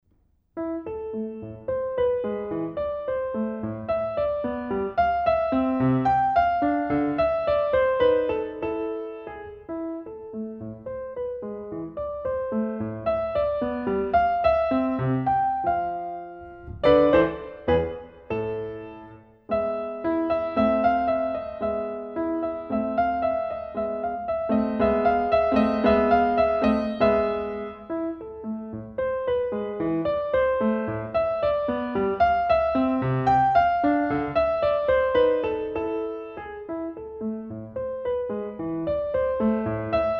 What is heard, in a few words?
Andante; Bach Ricci